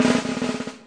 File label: rollin snare; single hits